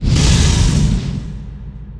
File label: Trap; RYL 2